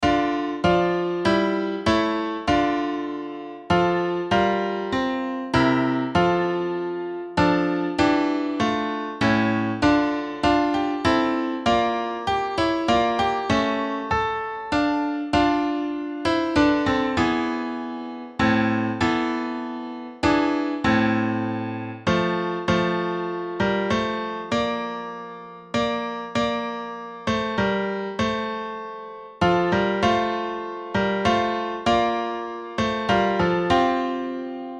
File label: tutti